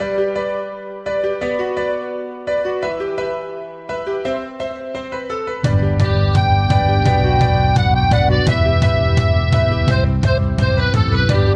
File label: ringtone